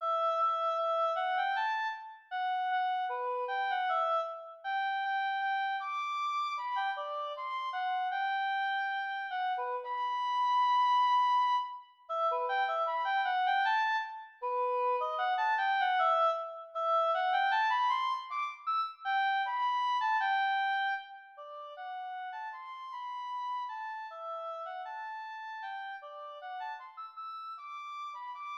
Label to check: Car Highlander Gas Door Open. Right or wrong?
Wrong